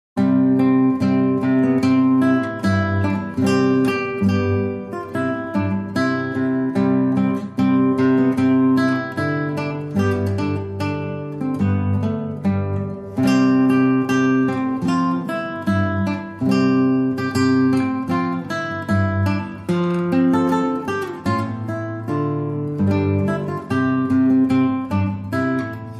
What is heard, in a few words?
Solo Guitar